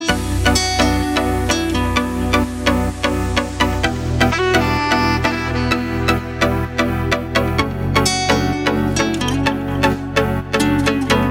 instrumental

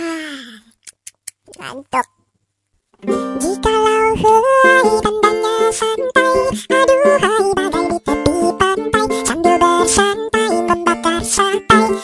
sleepy

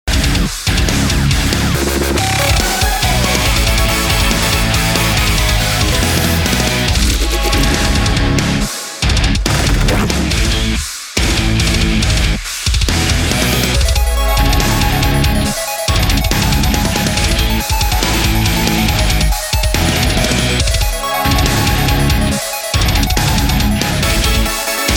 aspirations calm

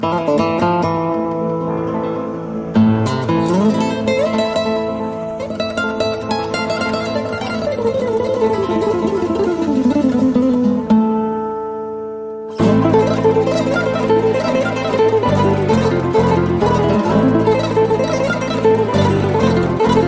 spanish guitar

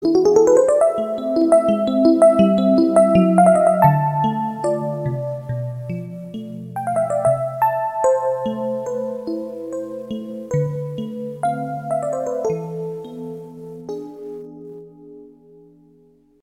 armenian romance

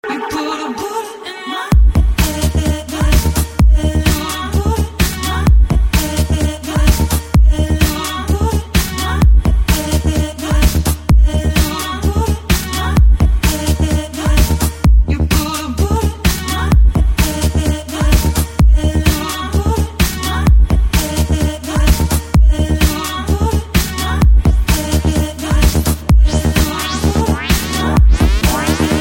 electro

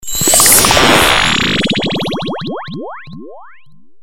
sms tone

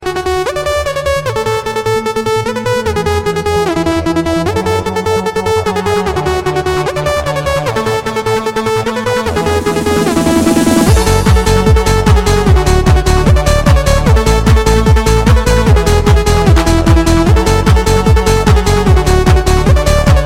best ringtone

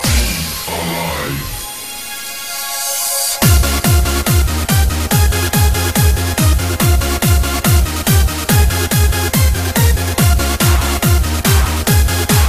trance

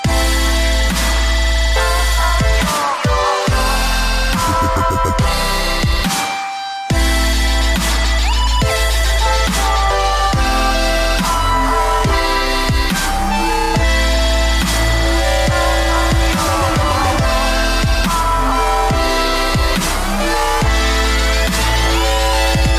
dubstep remix

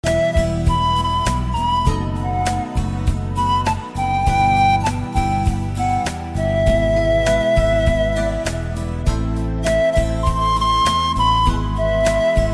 flute

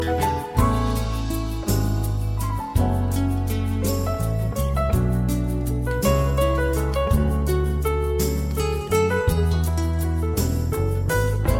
romantic

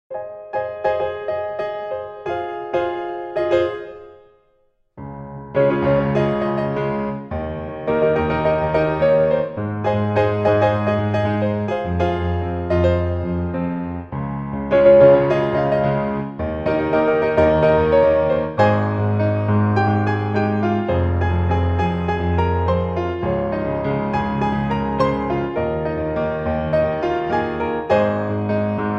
piano

